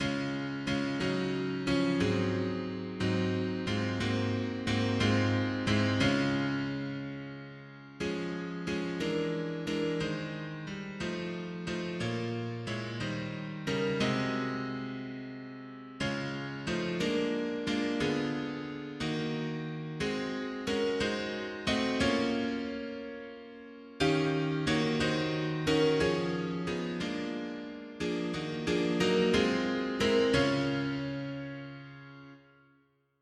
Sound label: mono piano; hymns; Himnario grande